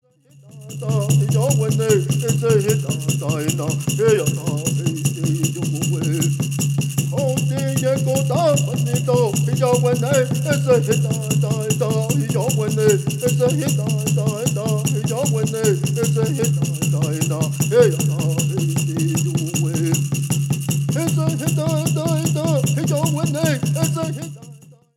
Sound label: Peyote Song